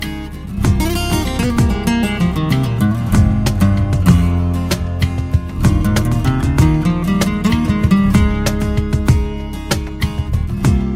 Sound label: telugu song bgms music ringtone